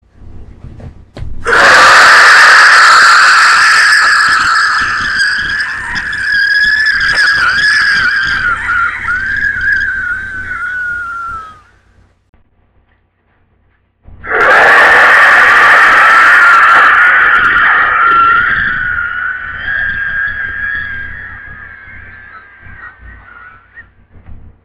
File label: Screams From Hell